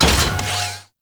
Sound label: Reactor Deactivate End; Ambience; Gameplay; Warframe; Corpus Gas City; Gameplay Remaster